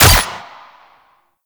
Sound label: Burst Pistol Fire; Warframe; Weapons